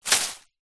tree branch small leaves fall from tree hit ground; foley; zapsplat